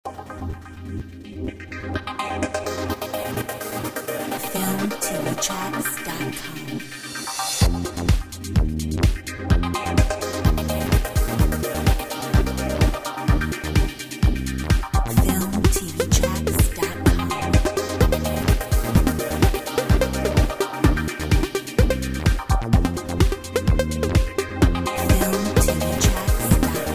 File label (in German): Elektro House